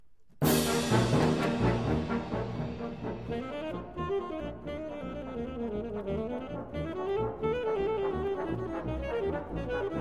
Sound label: concerto for alto saxophone and band